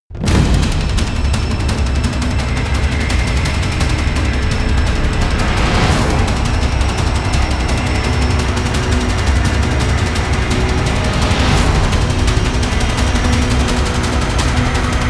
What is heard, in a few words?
drums of thunder